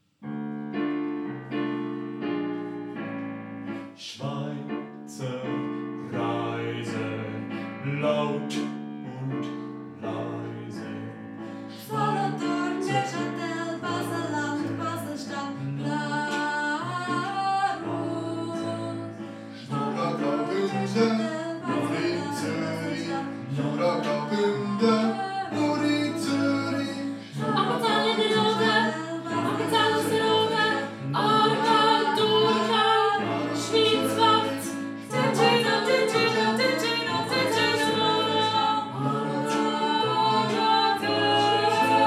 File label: audio tutti